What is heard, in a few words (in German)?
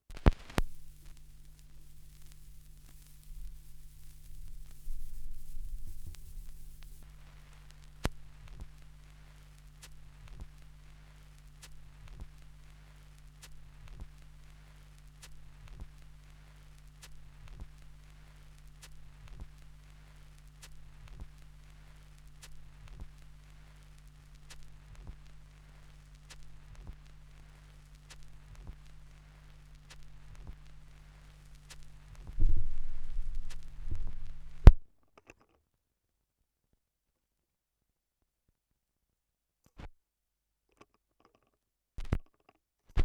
schallplattengeraeusche, Saxophone Improvisations; America Records